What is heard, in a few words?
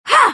female pain; firefight